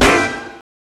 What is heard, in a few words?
orch hit funk